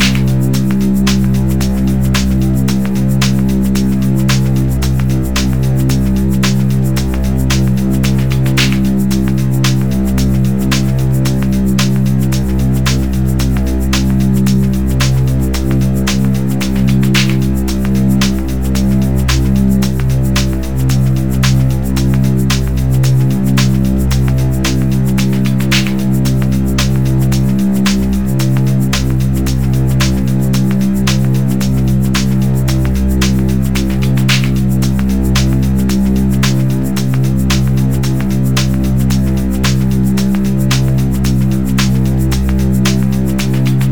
tension; Rosegarden; TV3

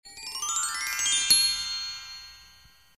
chimes; Rosegarden

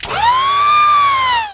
flaps

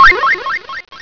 BLIPBLIP, Alarm; sound effect; Alarm en Sirenes

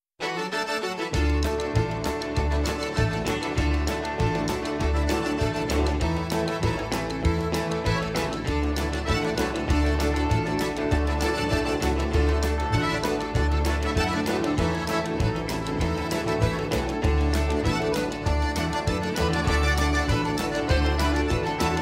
Swamp Stomp; Media Music Ltd; Short Cuts From Around The World

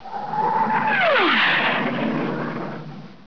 flyby; sound effect

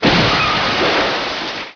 crash metal

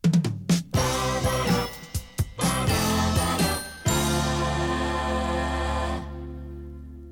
topformat maxi jingle single

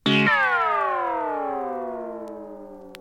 topformat maxi jingle single

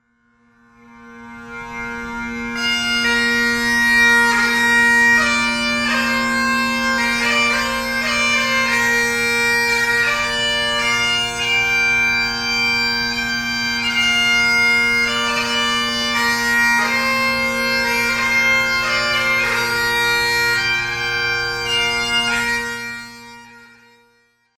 bagpipe selection, holophonic music; Holophonic Music and Sound Effects

